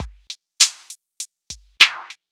Drum loops, Electro

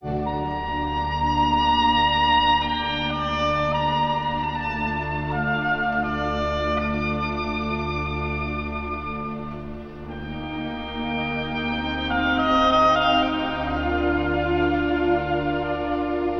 sax, sad; loops

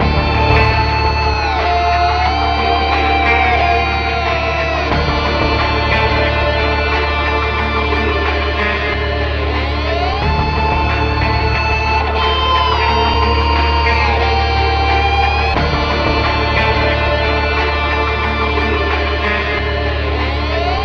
guitar, Loops, Sad

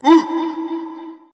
woo

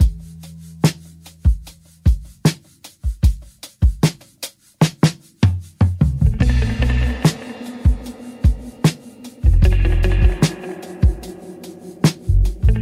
guitar invasion, boombap drum loop; Drum loops

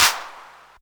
Snares, Clap n Snap

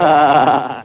laugh; fudd

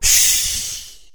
shh; ooh ahh; human

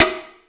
Snares, Soft

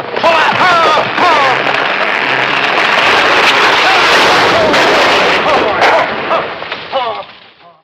crash cartoon; Crash Bang Boom

